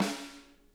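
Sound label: snare edge, drum kits; Sonic Reality; Interactive Drum Kits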